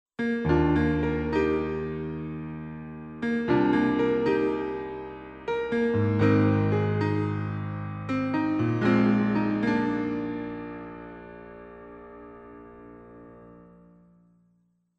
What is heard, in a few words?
Grand Piano Lid Open; TO Instruments Keys